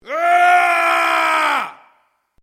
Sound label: MALE ROAR AGGRESSIVE SHORT